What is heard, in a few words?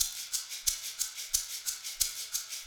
Metal Tank Shaker, processed; Sonic Foundry (Sony Creative Software)